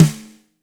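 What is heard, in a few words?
fat snare; Spectrasonics; Burning Grooves CD2; DRY KIT 3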